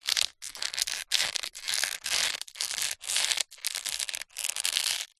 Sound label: plastic packet tough handling movements squeezes; foley